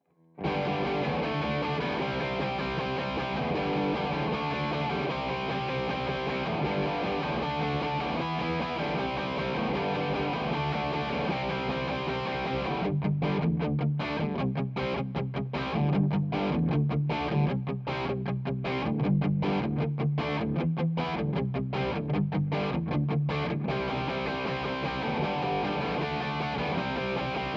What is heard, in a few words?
Guitar Bday